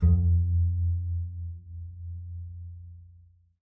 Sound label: F2, bass pizz; Xsample VOL-5